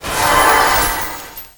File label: Repair Complete; Custom UI Sounds; Dark Souls 3